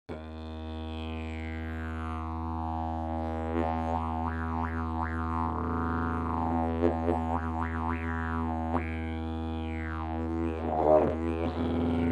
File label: didgeridoo; olse mahagon